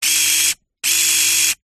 science fiction robot motor server movements

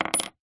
foley money coin set down on wooden floor; zapsplat

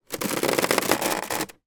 foley basket wicker move stress in drawer; zapsplat

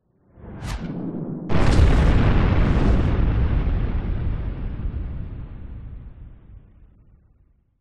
warfare missile incoming whizz by then explosion

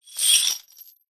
industrial chain set down ground

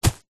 footstep single soccer boot run grass; zapsplat; foley

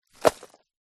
impacts tree bark piece wet drop ground; zapsplat

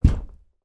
human body slump up against internal wall

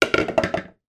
household saucepan put down on worktop

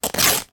foley cloth rip; sound effects one

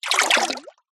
multimedia game designed water movement

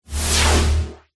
magical telekinesis blast; zapsplat

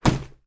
handbag put down on table; foley

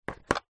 leisure game travel plastic box set down; cluedo; zapsplat

